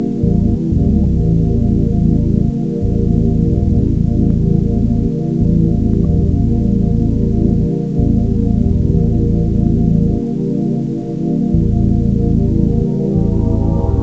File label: BG MUSIC; svencoop